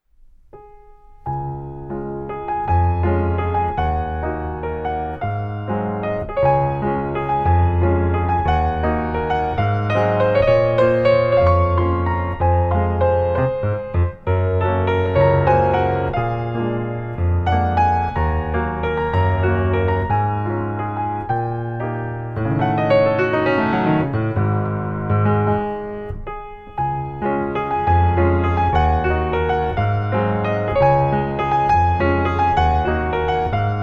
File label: christmas piano music